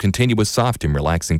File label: voice